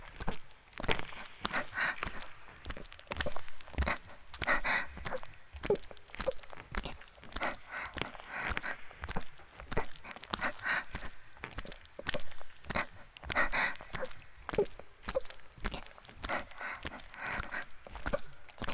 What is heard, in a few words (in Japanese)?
(雨降りバージョン) (薪爆ぜ音無しバージョン) ギシアンループ; 田舎の別の無感情少女と自己犠牲愛2